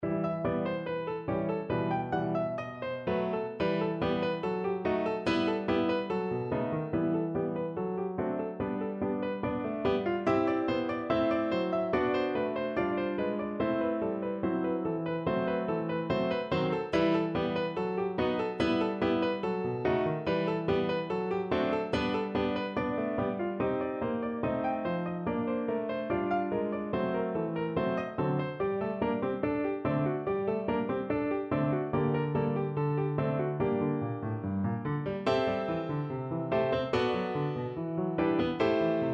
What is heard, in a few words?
toccatina